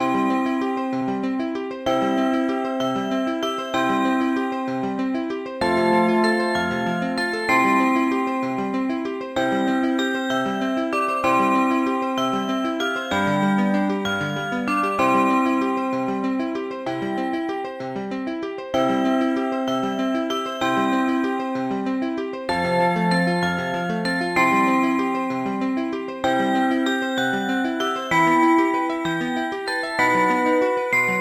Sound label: for english handbells and piano; in c major